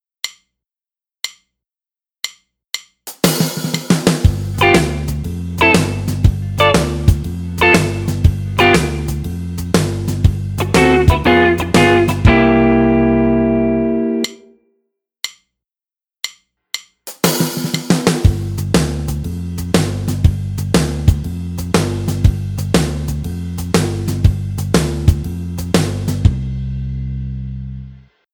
Build Up

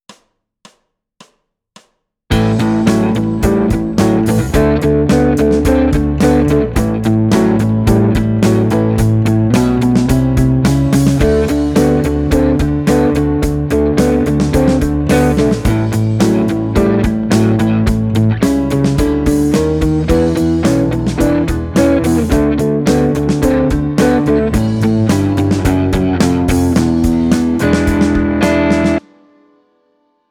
blues

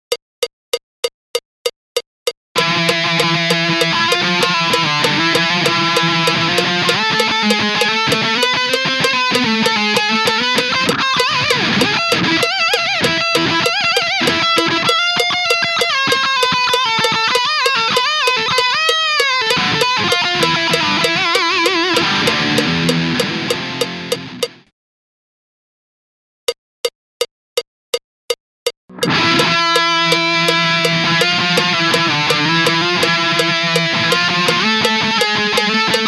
thrash

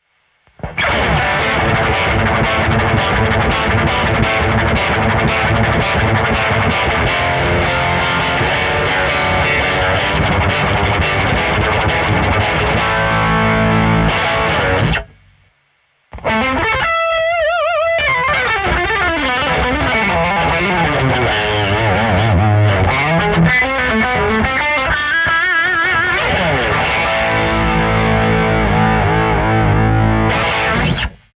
tone fender heavy

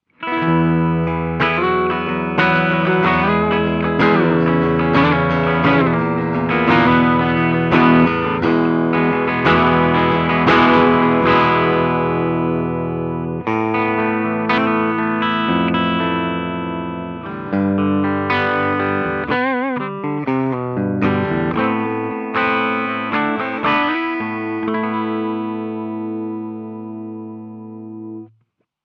SH5 Duncan Custom clean

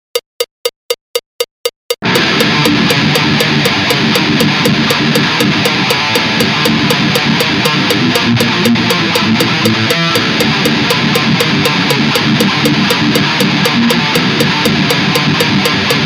thrash

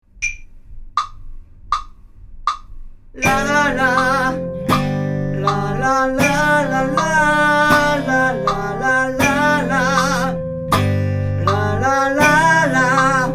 vocal

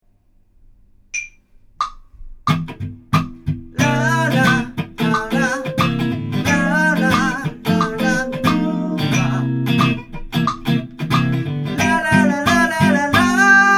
vocal

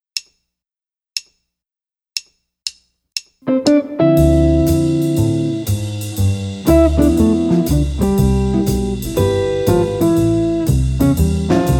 Jazz guitar

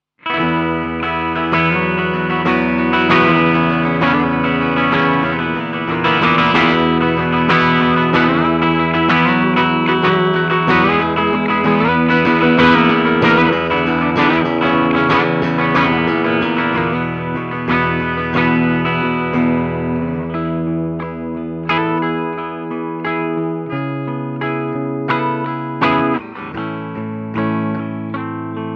clean; SH14 Custom 5

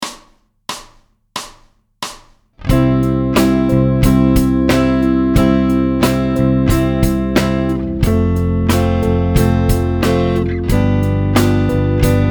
chord

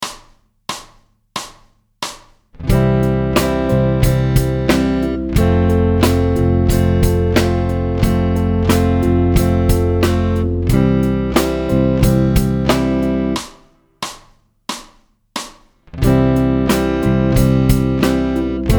chord

